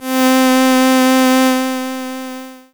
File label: Casio MT-600 Strings 1 C4